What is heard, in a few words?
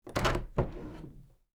door open